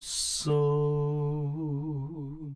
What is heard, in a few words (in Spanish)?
salida stretch, phase vocoder